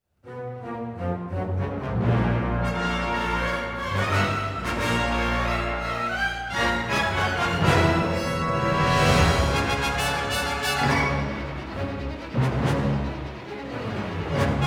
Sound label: Battles Shared Rebel Lose Loop